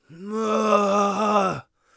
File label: bleeding, Warrior Voice; Warrior Voice Pack